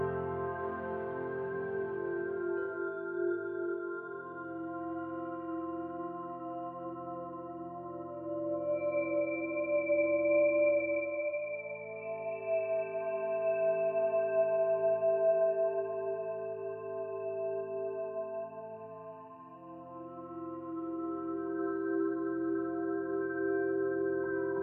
Cave; Daz3D; Tavern

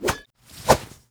Counter Attack; Opsive; UltimateCharacterController; Melee

